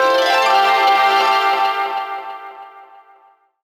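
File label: harp TOUCH FX; Rawcutz - Nu Forms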